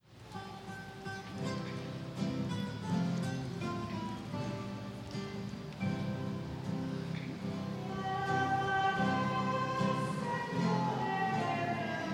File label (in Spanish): salmo 29 voces; misa